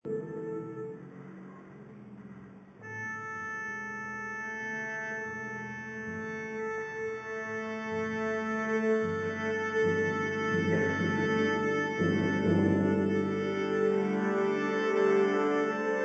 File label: the enthralling sound of tuning